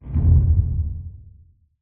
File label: whoosh